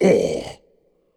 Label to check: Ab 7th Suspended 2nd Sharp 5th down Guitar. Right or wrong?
Wrong